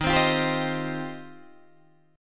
D sharp 6th Add 9th, Guitar, Open D

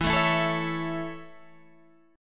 C sharp Minor Sharp 5th, guitar chord

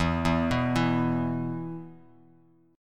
E 5th, Bass Standard; arpeggiated; JGuitar

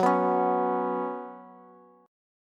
Gb Suspended 4th Gb, down, Bass; strummed; JGuitar

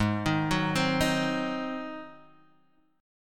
Ab 7th Ab down; Ab7 arpeggiated; JGuitar

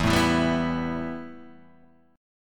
A 7th Suspended 2nd, E

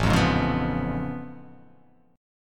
A Augmented Major 9th A down

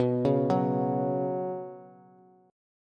B Suspended 2nd B down Bass, Bsus2 chord; arpeggiated; JGuitar

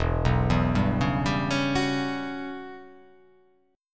E Minor 6th Add 9th chord

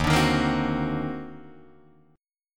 D sharp Minor Major 13th, Guitar, DADGAD; strummed

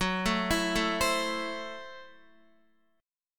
Gb Minor 7th Flat 5th, Guitar; Gbm7b5; arpeggiated; x,9,7,9,9,7

